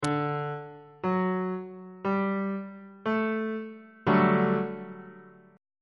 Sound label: g major 7th suspended 2nd 2nd inversion on bass clef